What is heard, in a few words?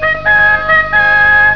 prisoner chime